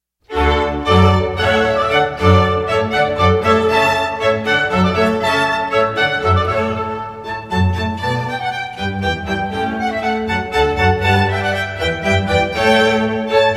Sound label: Hornpipe from Water Music